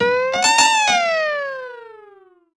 piano; gesource; DuClare Chateau

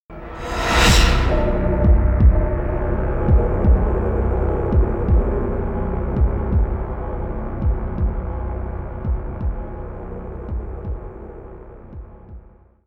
gameover; gesource; xshemps customs

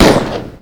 kf7 fire npc, weapons

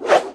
knife swing; gesource; weapons

